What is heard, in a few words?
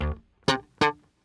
bass, Loops Phonky